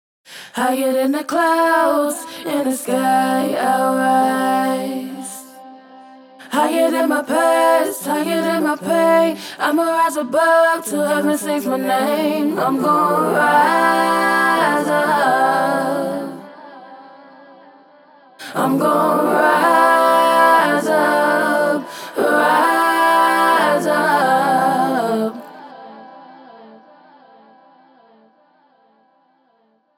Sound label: Rise Up Hook Acapella